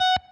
Staccato

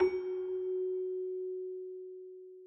Back Celesta Low F#

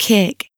kick; osu; Laurie Webb

